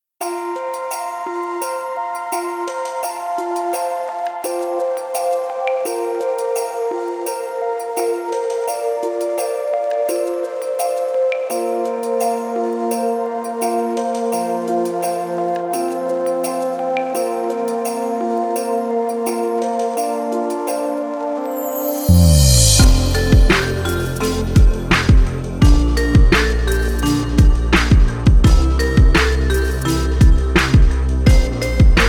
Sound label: jingle bells groove phonk